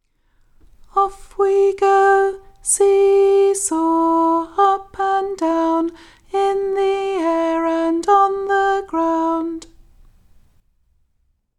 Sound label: See-Saw song; Jolly Music Tracks